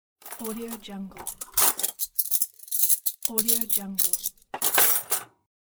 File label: cutlery forks